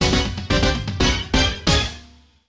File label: Battle Start; Custom Robo; N64 gamerip